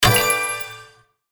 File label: mines win